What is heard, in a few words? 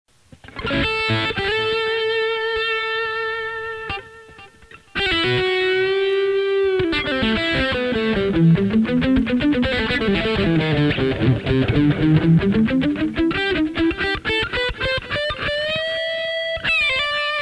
telecaster